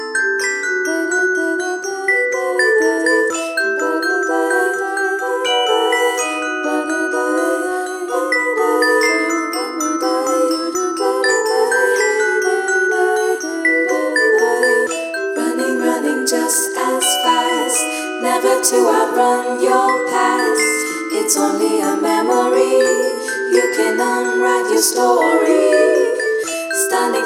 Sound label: Indie Music Track